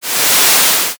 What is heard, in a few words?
water pour short